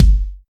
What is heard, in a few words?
kick drum g key, steel kick drum sample tuned to the G note. Loudest frequency: 288Hz; kick drum samples; drum single hits one shots